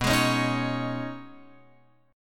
B 6th Add 9th B down Guitar Open F; strummed

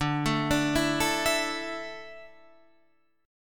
D Suspended 2nd D down, chord

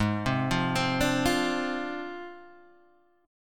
Gsharp 6th Flat 5th Gsharp Guitar Open G

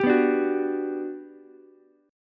G Major 7th, Ukulele; strummed; JGuitar

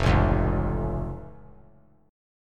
F Augmented F; strummed

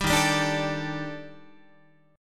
Eb Major 7th Flat 5th, Guitar; EbM7b5; strummed; JGuitar

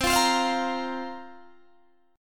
C 7th Suspended 4th, Guitar, DADGAD

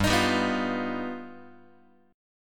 G Augmented 7th, Guitar; G+7 strummed; x,3,6,5,3,4; JGuitar

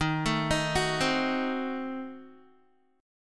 Eb Diminished 7th Eb, Guitar; Ebdim7 arpeggiated; 11,12,13,11,12,x; JGuitar

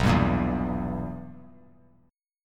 B Major 7th Suspended 4th Sharp 5th, chord; BM7sus4#5; strummed; JGuitar

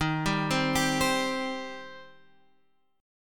G Suspended 4th Sharp 5th D sharp

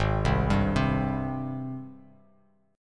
G sharp Major 7th G sharp; 4,3,5,5; JGuitar

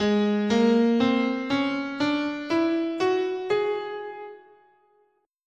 Major Locrian, scale, guitar; Gsharp

